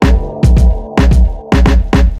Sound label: Cemented Atmosphere; ゆめ2っき